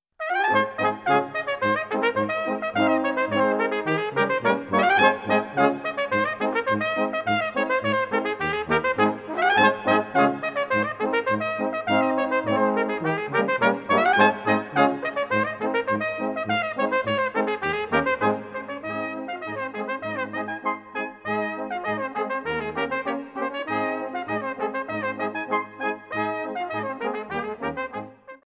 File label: Jig, brass band